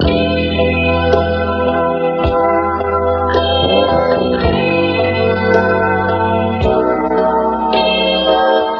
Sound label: guitar, Loops